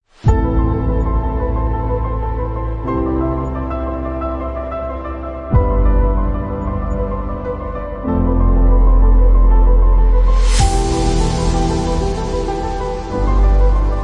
Epic motivation

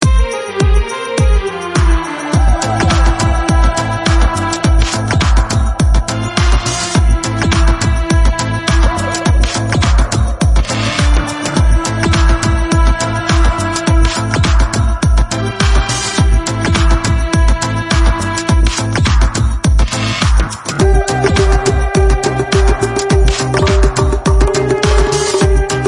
Future bollywood dance

